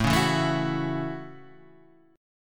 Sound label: A Major Add 13th A, chord; Aadd13 Chord {5 4 2 2 5 2} strummed